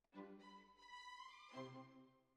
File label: Oboe